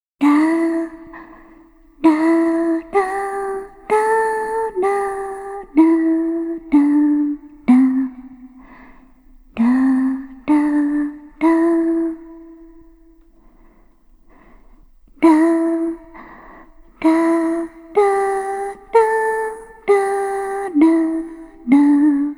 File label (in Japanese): 【楽曲】 子守唄 リバーブあり